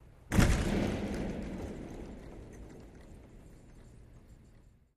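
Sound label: Hockey Body Hit Reverb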